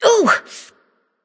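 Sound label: ouch, female; smite; voicepack; hera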